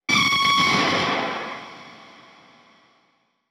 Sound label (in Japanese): kue